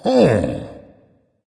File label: thinking dragon; fafnir